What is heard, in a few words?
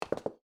Concrete Heels Land; コンクリート ヒール 着地音